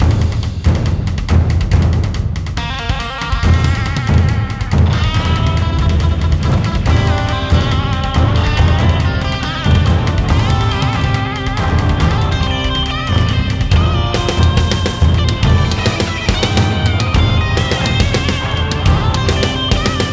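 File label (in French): Colère, Les émotions; Colère 1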